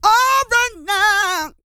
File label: SING, GOSPEL; Vocal Planet Vol1 Gospel